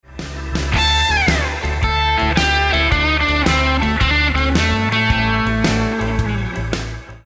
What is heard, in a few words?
Licks Lick, guitar